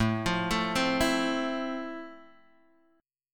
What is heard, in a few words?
F Major 7th A